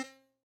Hohner Super64 Accented rel C3, Harmonica, Releases; Aerophones; Free Aerophones